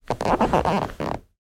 household chair sofa squeak creak as person sits down